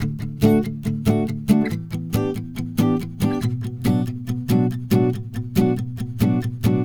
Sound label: Acoustic Pop Guitar 140BPM Bmin; Acoustic Pop Guitars; Vengeance; Essential Clubsounds Vol.4; Guitarloops